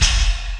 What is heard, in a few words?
CRAK SD, Industrial; USB Soundscan vol.24; AKAI